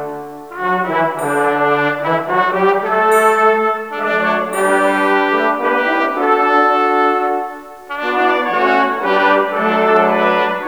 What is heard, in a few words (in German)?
toene, tuba